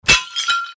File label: hit; Ares God Of War